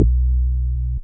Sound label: Deep Wave Bass